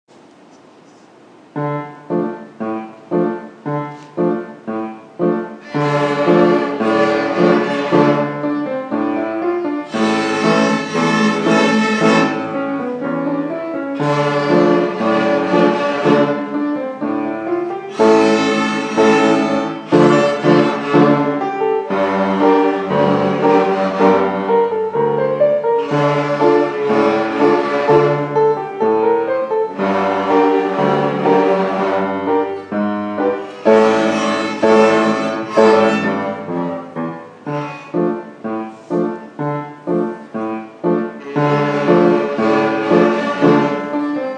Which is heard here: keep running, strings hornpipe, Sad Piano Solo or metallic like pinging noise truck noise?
strings hornpipe